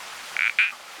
Bokermannohyla nanuzae

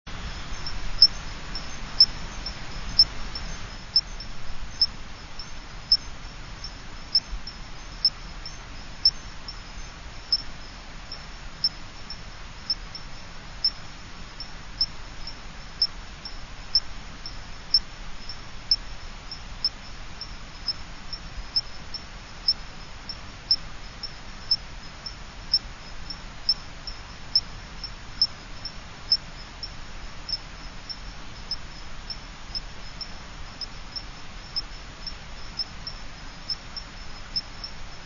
Adenomera marmorata

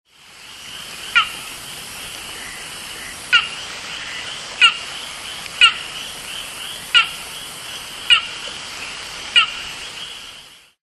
Gephyromantis plicifer

